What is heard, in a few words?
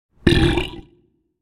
yeti burp